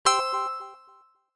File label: cashout sound